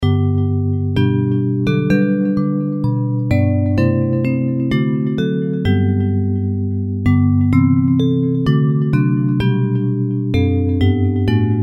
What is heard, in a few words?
Bells